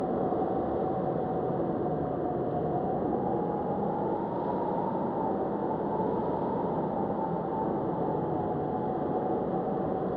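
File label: mountain wind loop; ambient; atmosphere; Counter Strike Model Pack; CS:GO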